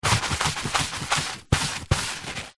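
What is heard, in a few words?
household paper towel tissue kitchen roll rub clean tiled wall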